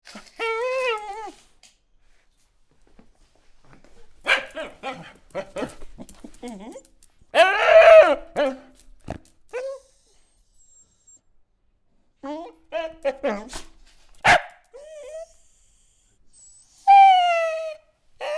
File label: dog cry bark; spoteffects